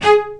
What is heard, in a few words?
Spicato Basses & Celli